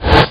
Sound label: swoop